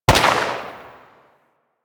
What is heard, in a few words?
Uzi Pistol Shot, weapons; Grn Uzi Pistol